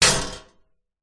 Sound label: medieval sword impact metal shield vibrate